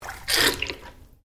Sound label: Water Bath Spa Water Empty; Domestic water movement; Nature